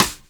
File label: Rimshot; Equipped Music; Loungin House